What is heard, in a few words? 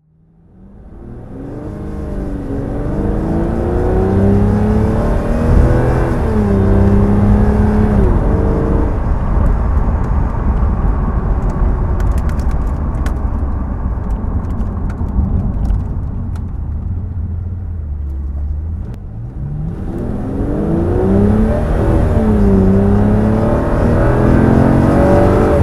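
aston martin db7 vantage convertible driving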